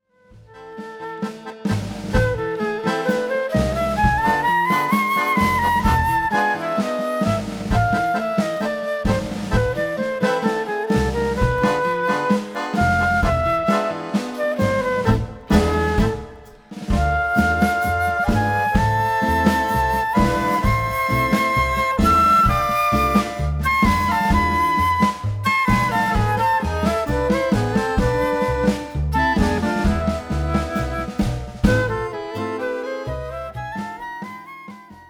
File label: Flute